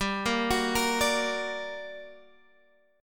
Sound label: G Minor Major 7th, down, Guitar, Standard; GmM7 arpeggiated; JGuitar